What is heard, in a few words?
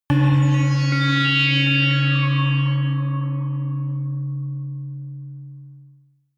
whistle synth high, drones